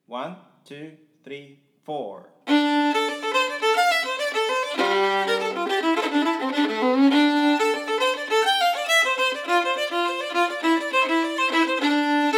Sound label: Fast, Fiddler; keyreels tunebook